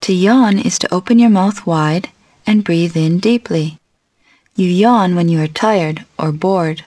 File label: yawn